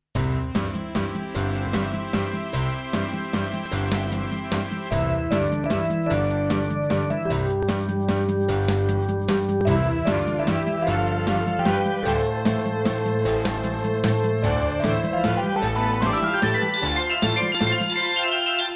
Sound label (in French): valse